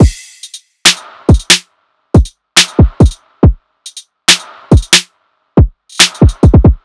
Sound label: DrumLoop 140 bpm; Kryptic Samples